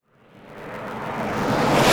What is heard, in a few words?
High Reverse Impact